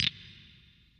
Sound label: Falling